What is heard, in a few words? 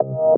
C STAB; Loopmasters; Funky Underground Vol 2